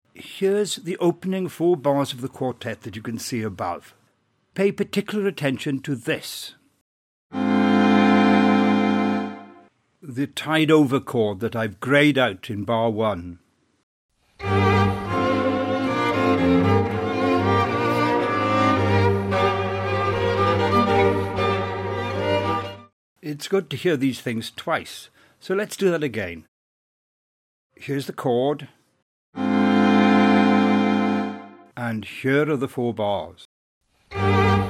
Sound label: quartet, string quartet